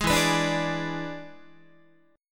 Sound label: E 7th E down Guitar; E7 strummed; JGuitar